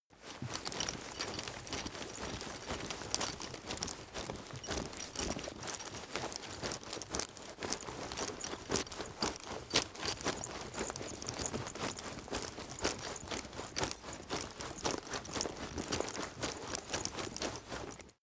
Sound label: leather metal walk fast sequence